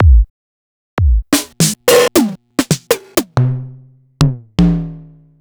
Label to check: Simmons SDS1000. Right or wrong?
Right